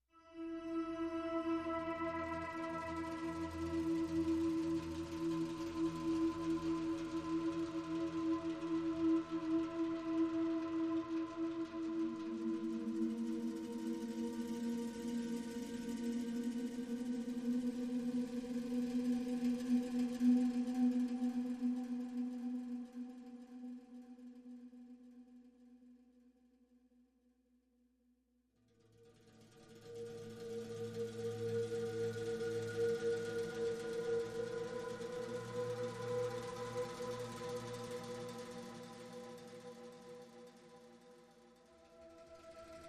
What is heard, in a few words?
Drones